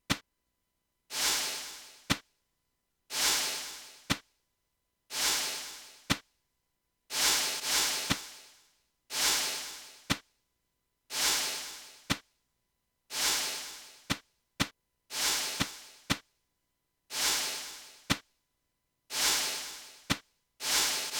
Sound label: Slow drums